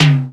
Old Skool House Perc

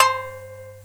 shamisen; AKAI S6000 CD-ROM - Volume 1

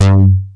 BASS G2; Q Up Arts; Dream Experience; BS+KEY

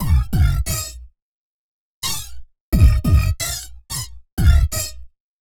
Beat Box, Vocal

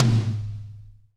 DRY TOM, TRASHY KIT; Spectrasonics; Burning Grooves CD2

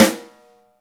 snare, Roland; Club-50 - Foundations Roland; Ext.Snare

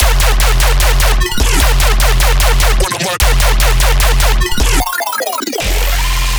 bass loop stomping 150 F; Virtual Riot

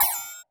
Korg 01W MegaDrums; 300 Drum Machines

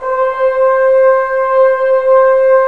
BRS Tbns; Roland - LA Composer vol. 4

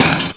SD, Drum & Bass; Ueberschall; Drum & Bass Resonance

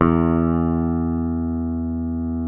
E2, Bass; Sampleheads; Will Lee Bass Library

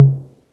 percussion one shot tom mid; angela; Sample Magic; Classic Chicago House; Drum Hits

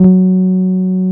TB-303

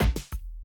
Classic DrumLoops

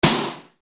GASH SD, Yamaha RY-30

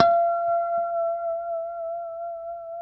F#5 pick harmonic, Guitars; Spectrasonics; Hans Zimmer Guitars VOL-1; JC-HARMONICS

